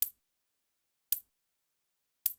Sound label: coin flip